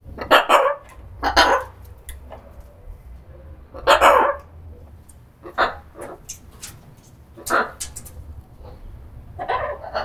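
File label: Macaw, Vocals, Chill, Close, Perspective; RZ Post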